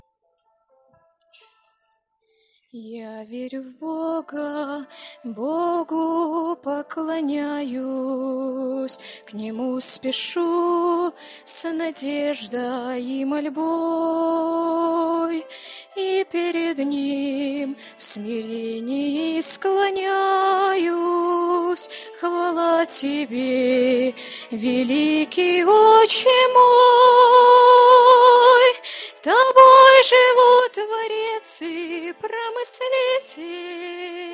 Я верю в Бога (Пение); Молодежное Лучистое

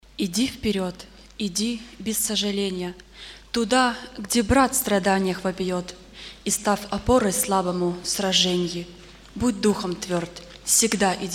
Иди вперед, молодежь; молодежь г. Белгород, Болховец; Молодёжное общение г. Курск

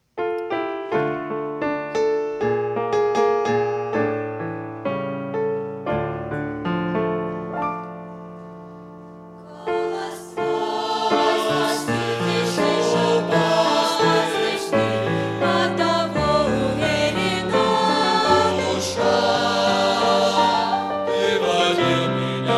Пение; CA youth camp; Голос Твой

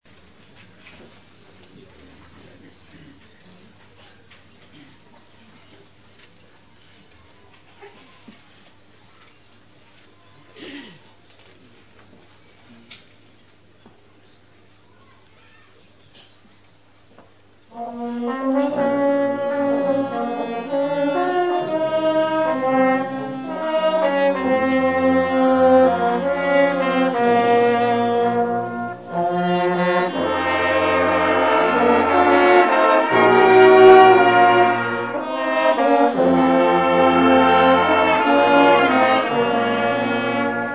оркестр; Похороны М.А.Горянина

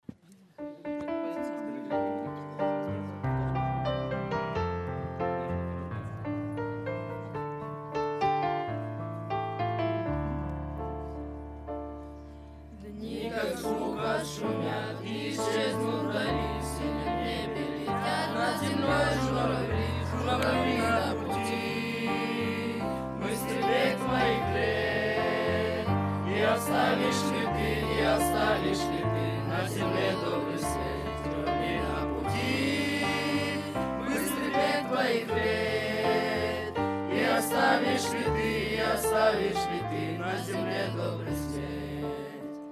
пение; 2016 Апшеронск, Подростковый лагерь

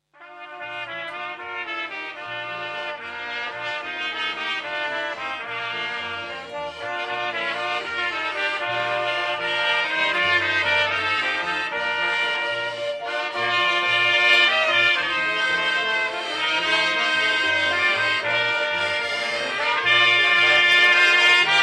Духовой; Молодежное Лучистое

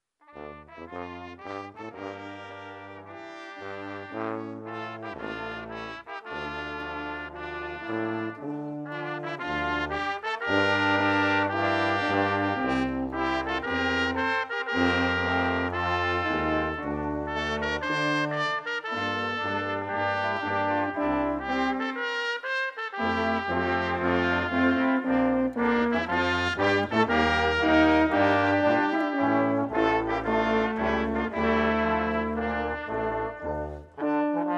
духовики; Бог сроднил нас на веки; Молодёжное общение г. Апшеронск

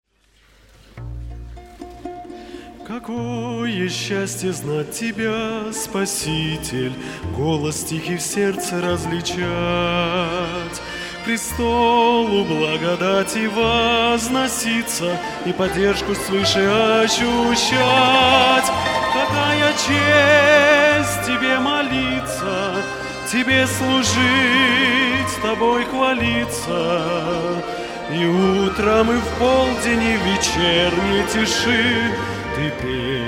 Какое счастье; Молодёжное общение г. Курск

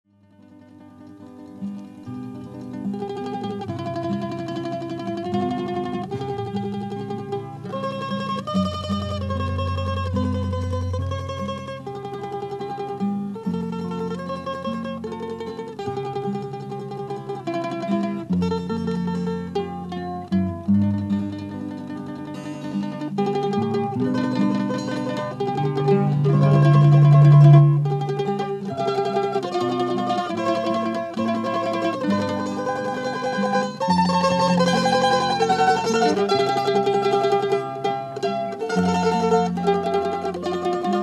mandolina; 2005 Приблизтесь к Богу, Молдова

